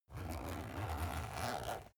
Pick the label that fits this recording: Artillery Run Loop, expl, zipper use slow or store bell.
zipper use slow